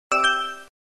alert ok

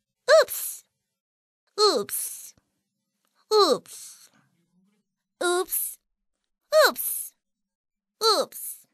oops

